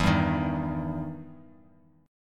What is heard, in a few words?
E Minor Major 7th E down; EmM7 strummed